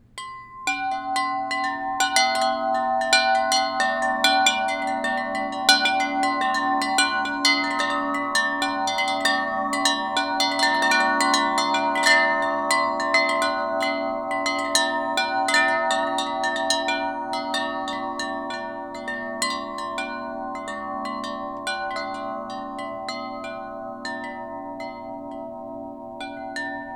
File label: windchime